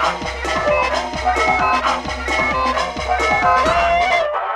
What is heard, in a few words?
CARNAVAL